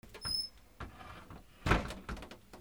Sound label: PHONES